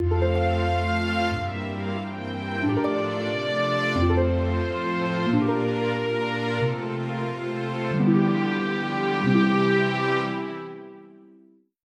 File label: sting arts completion; The Sims 3; Windows